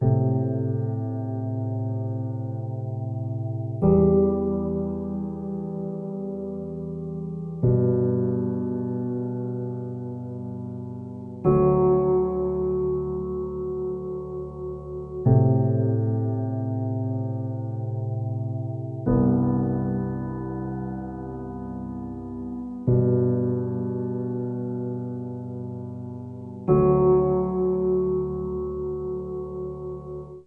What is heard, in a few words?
Piano; Inception The App